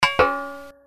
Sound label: mac clink klank; twitch